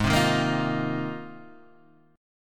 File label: G sharp 6th Add 9th G sharp Guitar Half Step Down